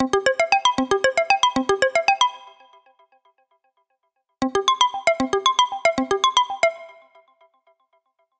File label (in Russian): zvuki dlya zvonka budilnika clock